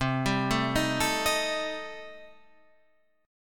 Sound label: C Minor 6th, chord